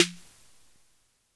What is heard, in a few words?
SD 808 Air